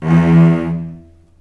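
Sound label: E1 arco long cello, left; East West; The Ultimate String Collection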